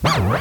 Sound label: Old Sick Organ